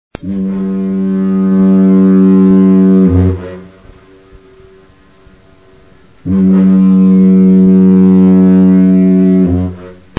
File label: Diaphone; Chebucto Head